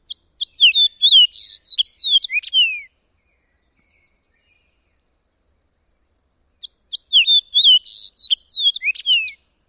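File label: songs red subspecies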